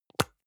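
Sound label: deodorant can lid open medium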